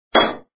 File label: metal clink; btd4